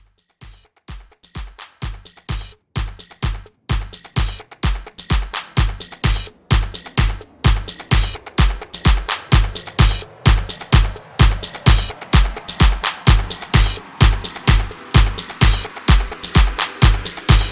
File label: Top40 Radio 128Bpm; Top40 Radio Edit